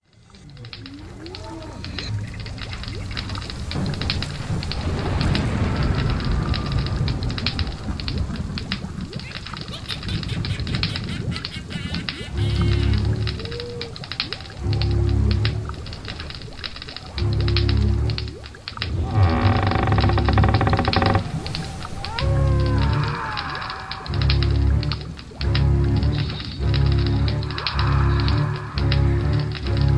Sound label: Halloween haunted House nightmare